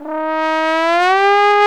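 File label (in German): POSAUNE AUFGANG ES3; Best Service; Alpine Volksmusik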